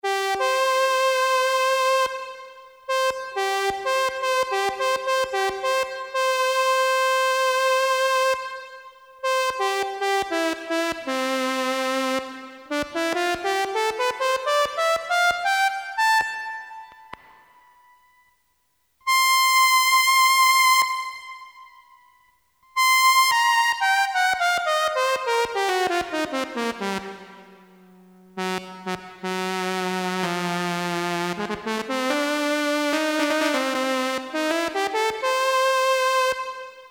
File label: Trumpet, Farfisa Syntorchestra Poli